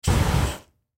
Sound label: Fireball Hairspray, Fire Hairspray Lighter Blow Torch; Flames; Objects; Flame Sound Effects